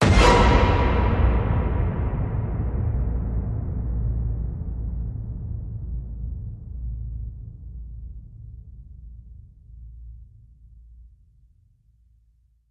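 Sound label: Hit Horror Fiddle Scrapes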